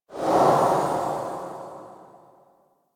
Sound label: Museum Item Look Whoosh; Warframe; Vitruvian Museum